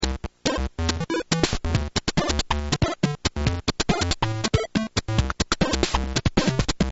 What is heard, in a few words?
bg music; spongebob; super-easy-fun-adventure